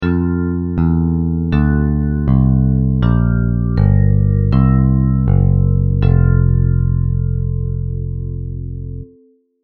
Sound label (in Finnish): basso